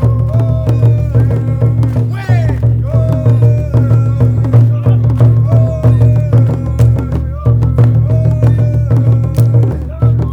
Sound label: RAINDANCE; Spectrasonics; Heart of Africa 2